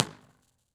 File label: Amaranth, Impulse Response; EchoThief Impulse Response Library